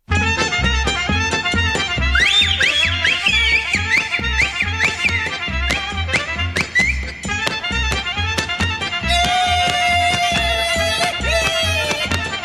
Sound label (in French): Batuta, Roumanie